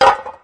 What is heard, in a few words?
Electro FX; Ueb HE Electro FX